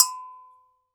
GANKOKWE, African Perc

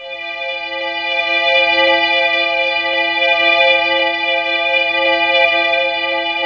cymbels C4

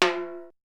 Perc; ZERO G - Datafile DISC 1

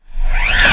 REV, SOUND EFFECT REVERSE; AKAI S6000 CD-ROM - Volume 1

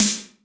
ROOM SN, ALLDRMSTD; Big Fish Audio; Synth City

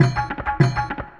Maqsuum, Acoustic Beats; Sonic Foundry (Sony Creative Software); Myths of Technology

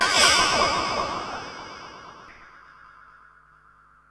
Ring Mod & Delay, contact mic Cymbal

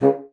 bassoon C#3; Xsample VOL-6

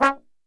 C#2, Brass Super Section, TRMB SHOT; Best Service

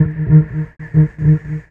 Bass Cello; Sonic Foundry; Sony Creative Software; Stylus Pressure Urban Grooves on Digital Wax; What Up

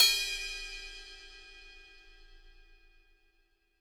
HiHat & Crash, Roland TD-20; HiHats

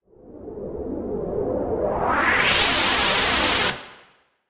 Swept Noise

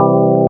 Hammond Organ Chord; Big Fish Audio; OSH Chords

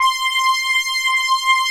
HORN STR; Pro-Rec - Synthsonic

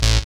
SYN ZRRRR, dance; Sound & Vision - Gigapack II

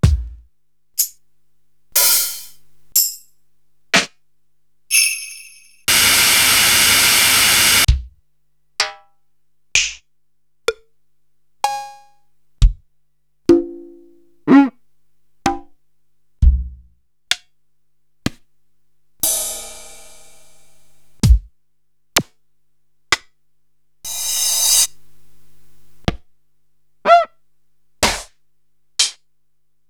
Korg TR-Rack, Samples Sliced; m8

